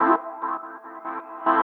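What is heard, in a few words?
Pad Synth; Equipped Music; Loungin House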